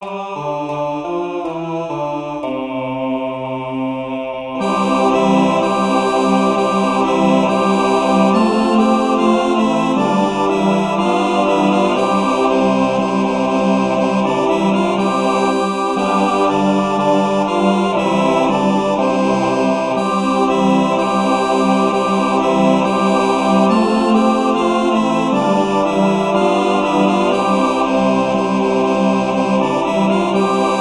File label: This Old Man Harmonica Ear Training